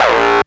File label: stabs; stabs & hits